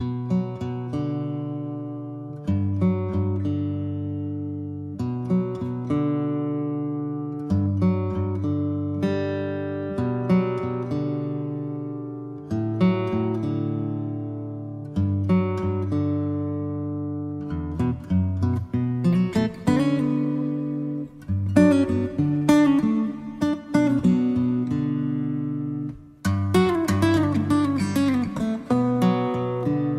Solo Guitar

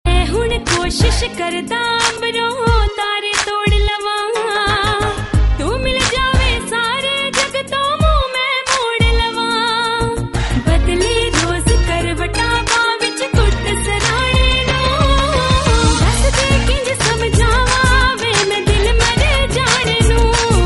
Punjabi Song Tone

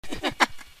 giggle; IMSCARED

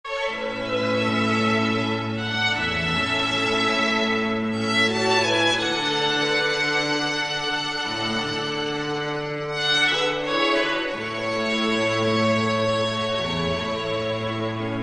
Soothing Violin

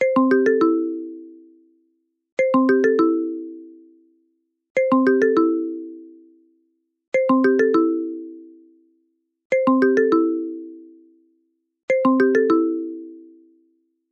Dialling; GrabCall

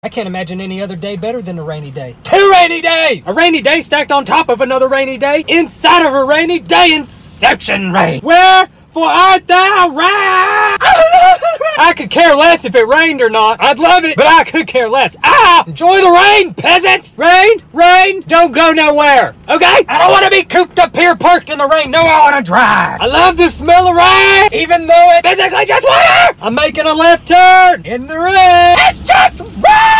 Rain

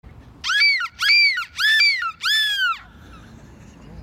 Seagull

